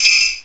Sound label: wave 58; direct sound samples